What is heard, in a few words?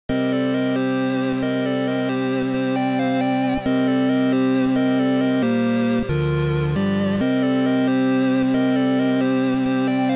GameOver; one strike